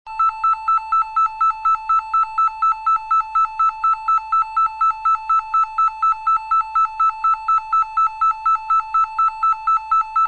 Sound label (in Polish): syrena